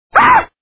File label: aahh